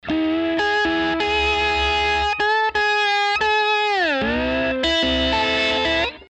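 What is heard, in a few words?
slide organ; SLIDE JO ORG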